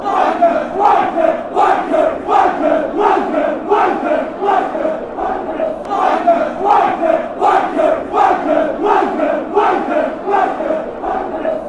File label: wnker, fan chants; boro